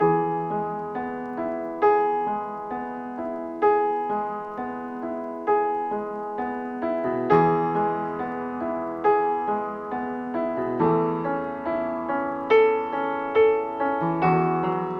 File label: piano, sad loop